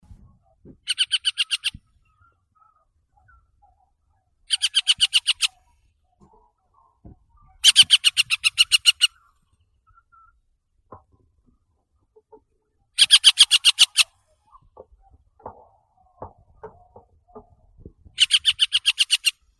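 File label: hen harrier, Circus cyaneus; lun polevoi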